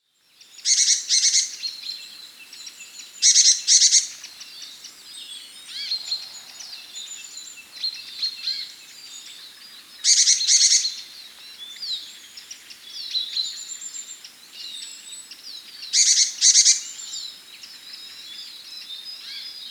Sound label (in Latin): Parus major; SETE